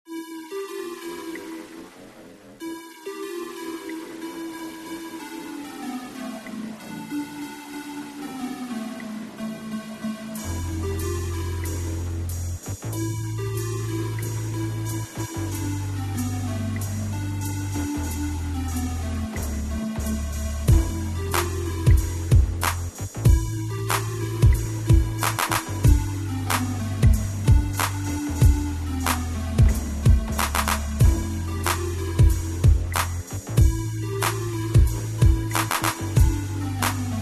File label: first beat with cubase hiphop r b